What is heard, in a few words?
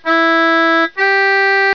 interval